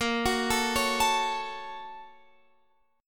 A sharp Minor Major 7th Sharp 5th, down, Guitar, Open F; A#mM7#5 arpeggiated; JGuitar